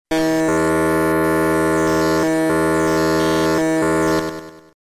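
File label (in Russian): шум в рации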